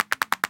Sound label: Crab; Peace, Death; Fatecard